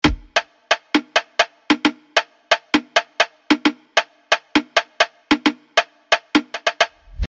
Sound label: drum